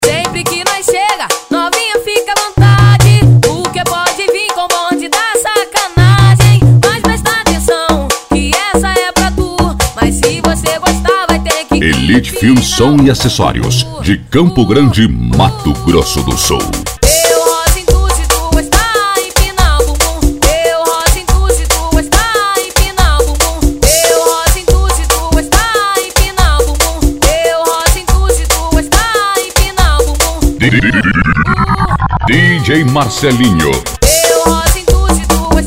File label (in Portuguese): eletronica; paraná; edição fervo 2016